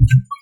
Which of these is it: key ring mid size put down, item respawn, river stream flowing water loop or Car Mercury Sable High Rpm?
item respawn